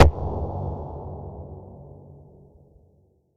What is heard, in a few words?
Automatic GSh301 End, Weapons; DCS